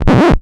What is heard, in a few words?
EFX, SFX KIT; Wizoo - Platinum 24 Electronic